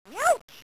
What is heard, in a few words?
youch